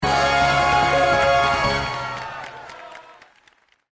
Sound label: Symbol Wins Bonus; Victory Lane